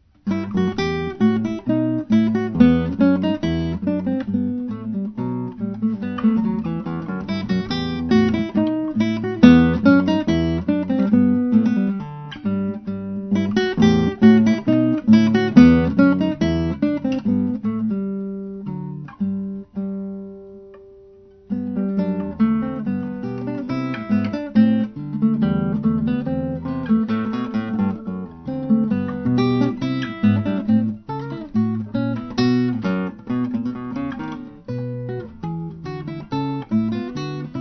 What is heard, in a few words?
Bourree